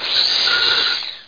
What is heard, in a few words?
log eat; firefly3; austrlia